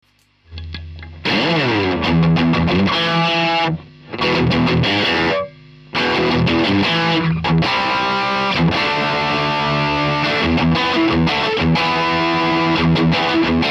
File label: STRAT DST